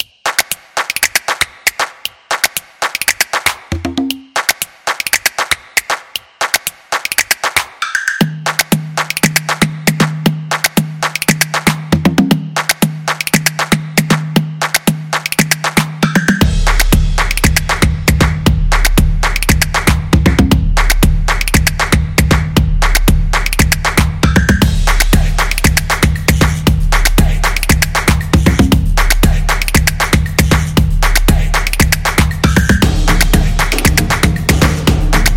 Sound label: Funny Beat